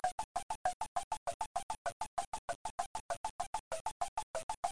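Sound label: temple block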